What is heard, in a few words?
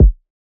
Kick; Maui